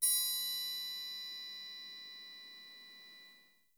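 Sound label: triangle, right; Miroslav Vitous - Percussion & Harp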